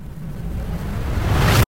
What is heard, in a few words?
pre explosion; battle; CrossCode